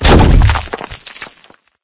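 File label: rock wood dirt; video games; source engine; ep2; dog v strider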